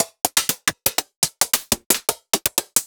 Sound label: Hats Closed; monosounds